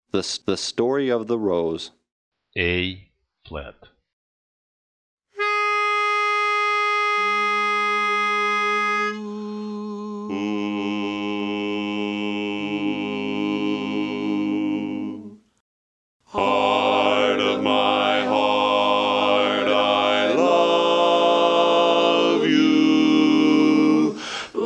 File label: Bass, barbershop quartet